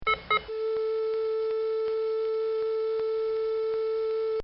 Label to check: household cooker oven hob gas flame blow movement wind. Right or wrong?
Wrong